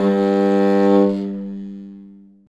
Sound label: nyckelharpa G 2; SoundBytes - Early Patches